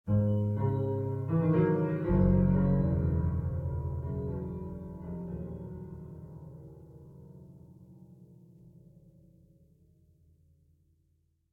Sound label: Stinger Enter Mineshaft; Lethal Company